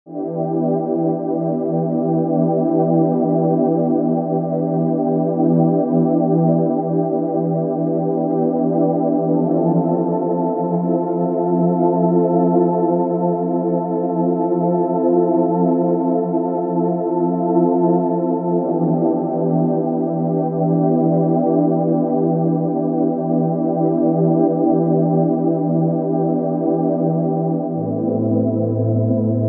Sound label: Airy melancholic synth pad 104 bpm